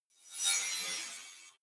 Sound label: fly by digital shards